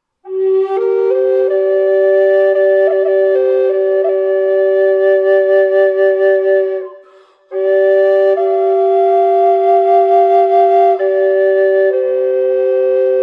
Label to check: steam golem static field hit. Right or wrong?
Wrong